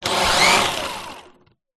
household vacuum cleaner dyson v7 stick short burst close up rotating head